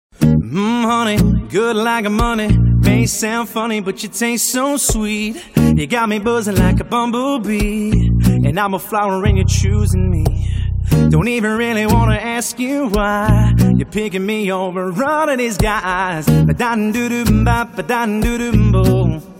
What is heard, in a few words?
bumblebee ringtone